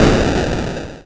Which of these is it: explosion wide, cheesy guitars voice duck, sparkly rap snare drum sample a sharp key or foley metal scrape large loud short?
explosion wide